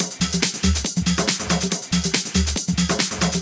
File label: Loop 140 BPM; Vengeance Samples